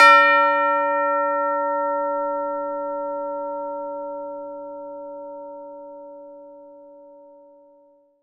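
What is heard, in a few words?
CHIME C4, Synclavier Percussion; ILIO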